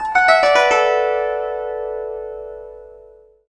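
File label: guzheng; Spectrasonics; Heart of Asia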